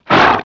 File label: Horse Snort Generic